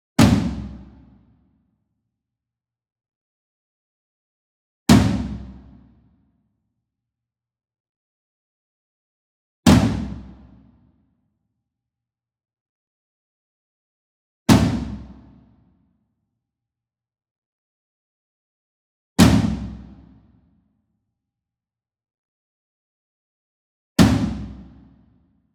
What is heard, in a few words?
ENSEMBLE DRUM Concert Toms Hit Medium; boom library; Cinematic Strikes Construction Kit